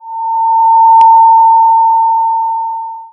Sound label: Security Scanning